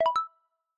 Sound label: sound fx end